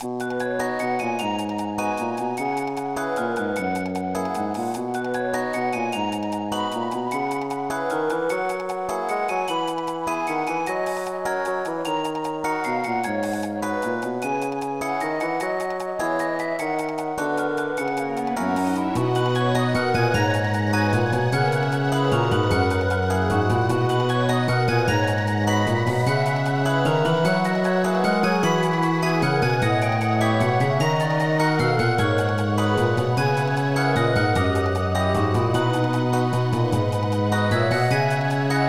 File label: BGM Menu; anpanman to asobo abc kyoushitsu 2008 nds gamerip